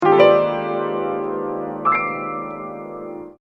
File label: piano original